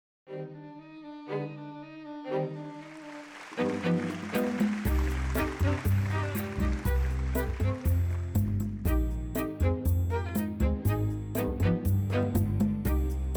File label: Latin Strings Mix; The sounds of LatinStrings (strings, bass, percussion, applause) are drag-and-drop components built into Apple's GarageBand 1.0